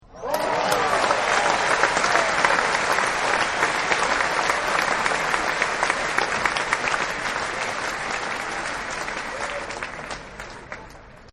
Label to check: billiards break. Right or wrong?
Wrong